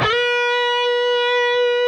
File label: PRS C, Guitars, LEAD; Spectrasonics; Hans Zimmer Guitars VOL-1